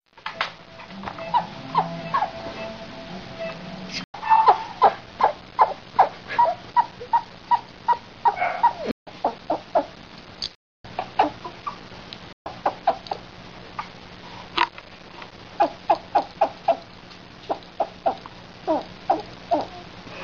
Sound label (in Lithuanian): lojimas kutenamas pilvukas, ferret